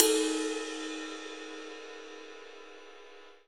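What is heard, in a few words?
ride cymbal; E-MU Producer Series Vol. 7 – Old World Instruments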